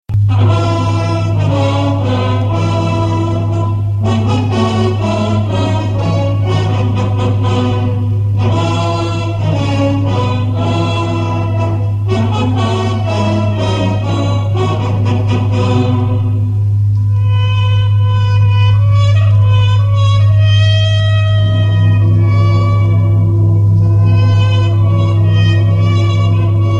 brass, military music in Italy; Il 29 luglio; WWI

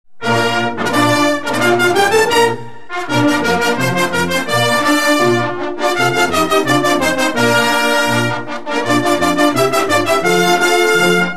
brass; Il reggimento di papà; MILITARY MUSIC IN ITALY; WWI

